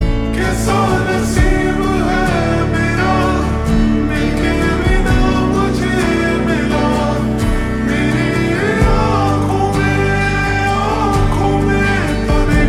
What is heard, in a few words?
Ringtone Slowed Reverb